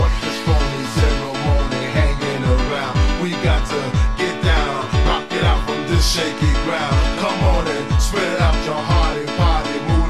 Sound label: Bass Heads